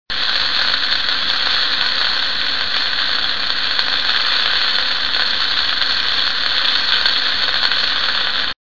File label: flare sizzle